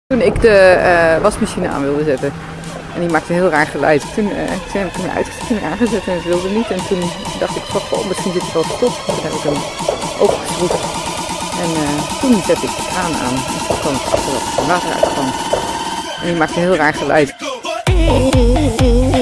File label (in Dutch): raar geluid remix, ringtones